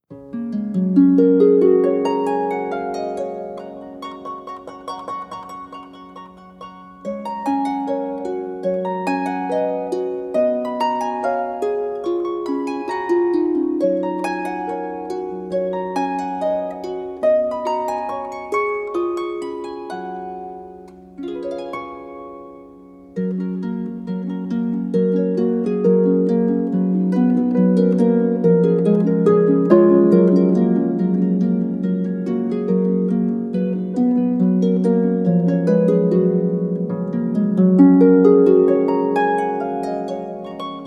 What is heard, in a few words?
Jingle Bells